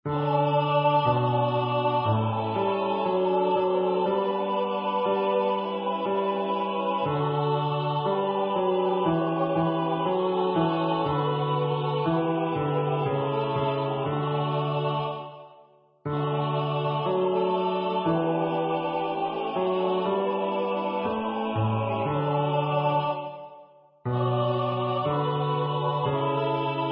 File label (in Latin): Benedictus Sit Deus SATB Bass